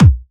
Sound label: Bassdrums UK Trance Kicks